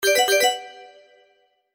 Positive Message

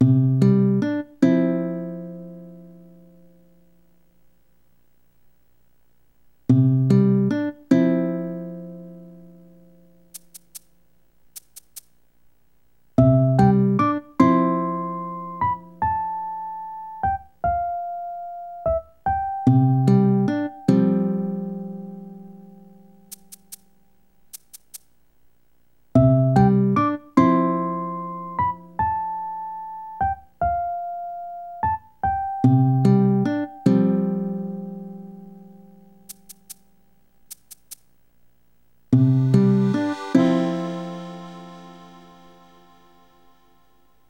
1 AM (Rain); animal crossing let's go to the city

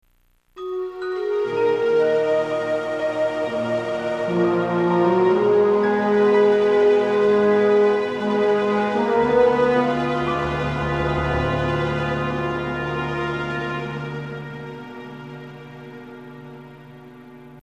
indy dies; Indiana Jones and the Infernal Machine

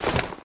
Rustle Body Movement Crouch

